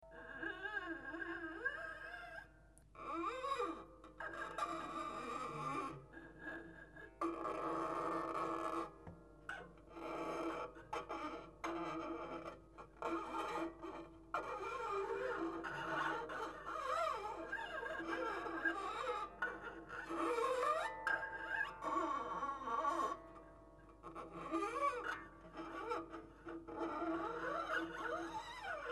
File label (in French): ongle, harpe